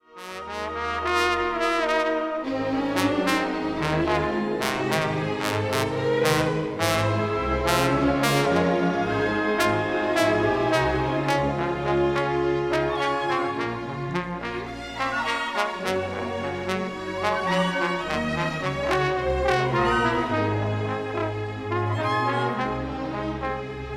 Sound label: Ragtime (Andante); Berlin 1986